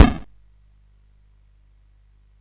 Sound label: SFX; Narco Police